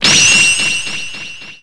melee struggle tie; RPG Maker; Legend of Heroen v1.20; DBZ

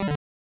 cancel; RPG Maker; The Huntress of the Hollow v1.2

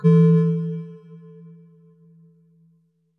cursor move; RPG Maker; Grimm's Hollow

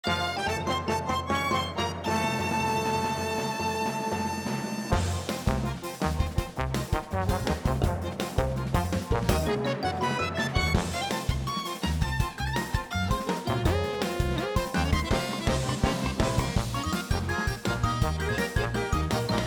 BGM BTL; PAPER MARIO Color Splash; wiiu

